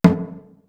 SM KOREAN DRUM; East West; Global Sounds

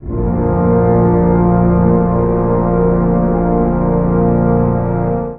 ORC G, ORCHESTRA; Best Service ProSamples vol.55 - Retro Sampler; AKAI; ORCHESTRA 2

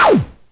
Perc Hit, Noise

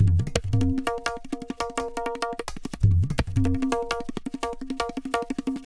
tabla, 170 bpm; Zero-G; Pure Tabla

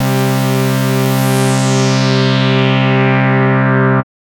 Synths Note C

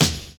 JAZZY; Univers Sons

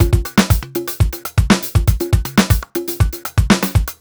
120 full, Nu Jazz House Beats; PowerFX

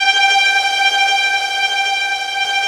TREMOLO G5, TREM STRINGS; Greytsounds VOL-1

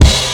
Kick Crash Combo, Kick One-Shots; KJ Sawka - Drum n Bass & Beyond; Drum Tool Box

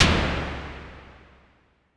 Drum X FX, Pearl

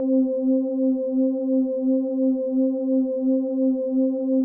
C4, synth basses; Music Store Sound Service; Einstein

